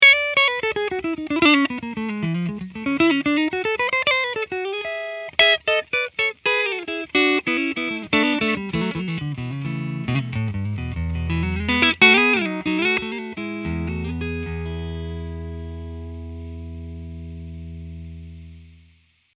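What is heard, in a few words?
Clean riff 1 - full FDM attenuation; eminence; Guthrie Trapp; Maverick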